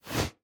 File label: Menu Notification In; A Dance of Fire and Ice